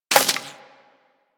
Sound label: Bullet Impact Debris Wood